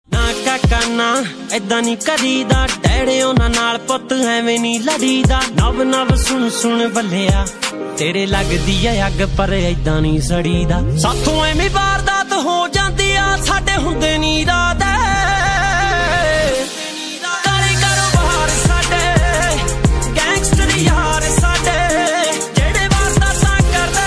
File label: Desi Punjabi Ringtone